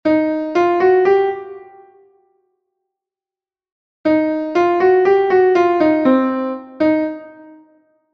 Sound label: blues phrase